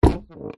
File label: foley footstep single boot ladies wood floorboard creak; zapsplat